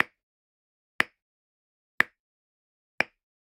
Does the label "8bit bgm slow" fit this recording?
No